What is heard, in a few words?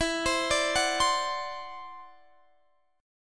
E 7th Suspended 2nd Sharp 5th, E, down, Guitar, DADGAD